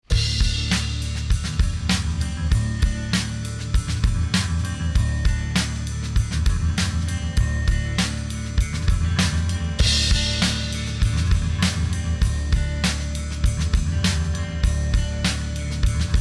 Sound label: ENDING THEME; Siren Blood Curse